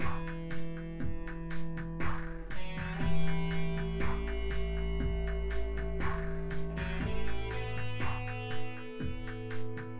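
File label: with drums synth conv 5cm mix; SASSEC07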